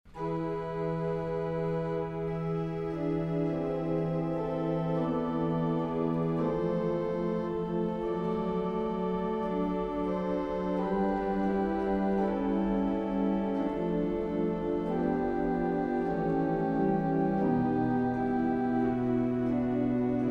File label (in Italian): Toccata per l Elevazione